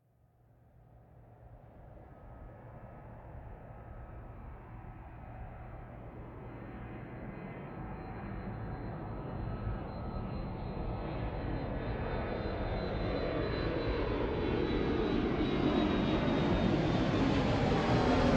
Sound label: Plane flyby; KMP Film Video Sound Effects